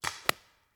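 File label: Paintball Gun Impact; Sound Ideas; Guns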